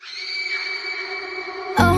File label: scream and oh